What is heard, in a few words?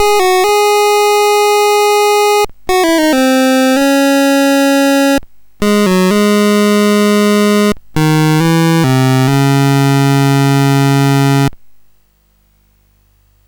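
Quest of Kings BASIC, MOS 6581 SID chip music, games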